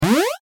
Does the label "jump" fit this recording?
Yes